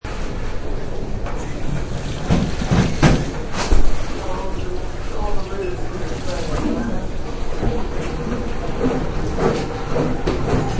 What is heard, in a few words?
Public Bathroom, General noises in a public bathroom.; Bathroom Sound Effects; Household